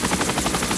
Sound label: Helicopter Engine; Red Shark